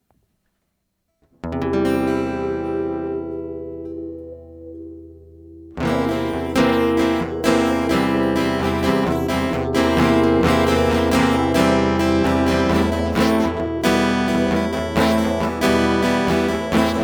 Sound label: Clueless Guitar; hyper terrestrial